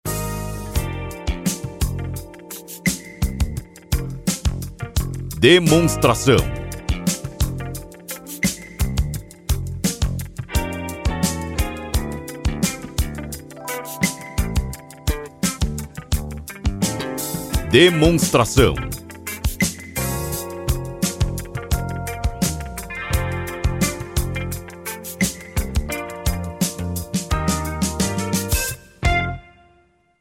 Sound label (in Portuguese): Trilha